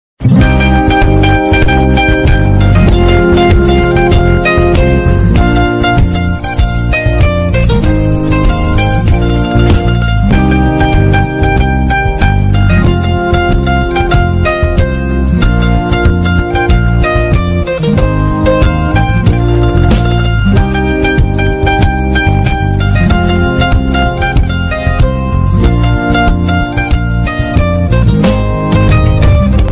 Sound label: movie background short romantic bgm ringtone; genelia d souza